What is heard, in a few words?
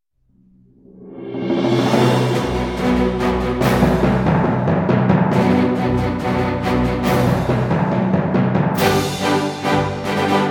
timpani